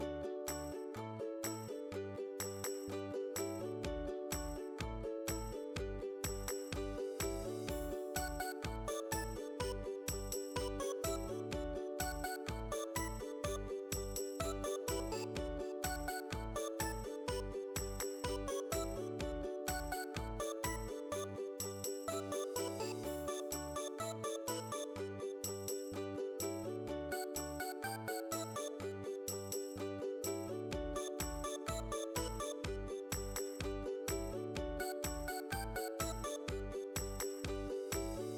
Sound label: music cheerful; bgm